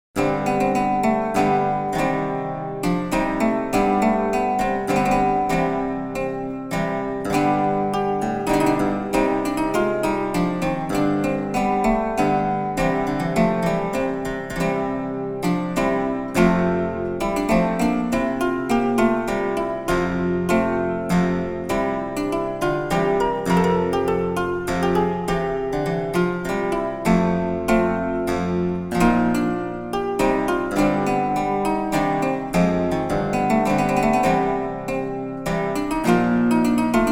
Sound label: German Harpsichord 1741 - Lautenzug; Excerpt from My Ladye Nevels Grownde by William Byrd; edition organeum